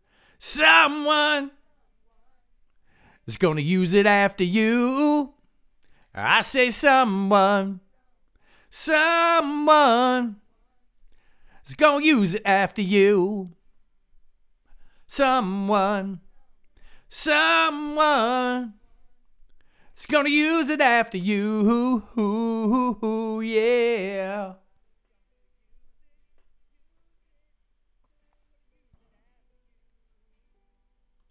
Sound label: vocals